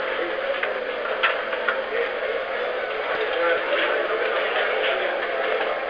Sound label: crowd